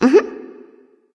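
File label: nonverbal agree; smite; ratatoskr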